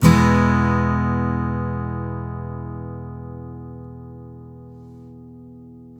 MAJ E, CHDMAJ, Blues Guitar Samples; ILIO; Fretworks

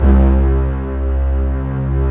MIDI Section; Roland L-CD702; Combos 2

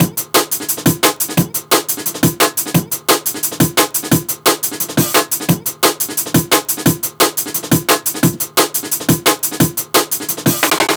Heli Blow B 175, classic dnb breaks

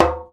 djembe; Univers Sons; Basicussions

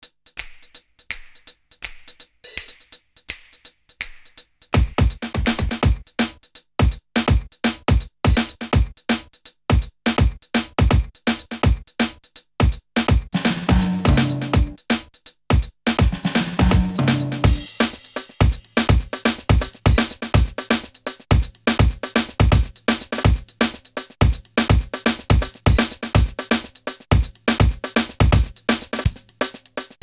breaks, Jungle; Zero-G Jungle Frenzy 1

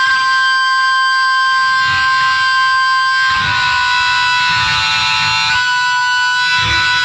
TUNE, Funk Guitar; 90 s Sample CDs; Zero G

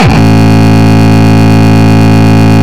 Longkick, kicks

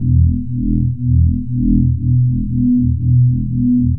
Synth Drone; Synths; Sonic Foundry (Sony Creative Software); Prototechno

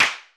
rim, Roland MC-909

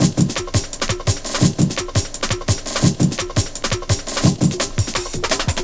Drum & Bass Beats & Breaks

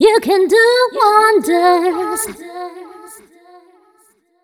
f 128 mel e, fem Vocals

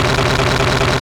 WAR FX

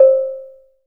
AFRI AGG MAL, AFRICA

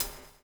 BUBBLE; Spectrasonics - Backbeat

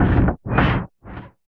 WIND; East West - Phat & Phunky

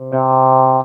TB NAH, Talk Box

